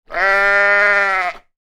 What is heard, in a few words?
sheep bleat; Sheep Single Bleat; Animals; McKinney Sound